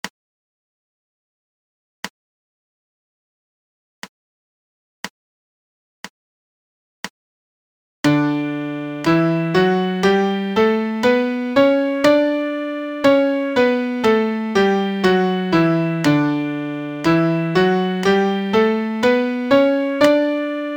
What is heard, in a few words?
MIDI Major Scale D